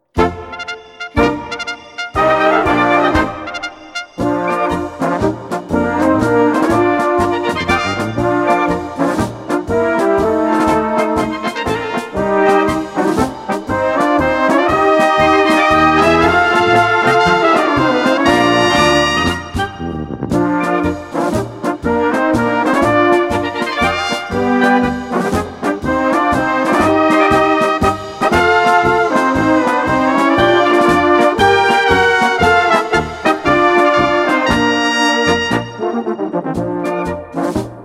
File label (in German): Blaskapelle